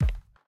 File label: Step Wood; Game rip; MiSide